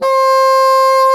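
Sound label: HARD BASSOON C4; Northstar - New Gold VOL-2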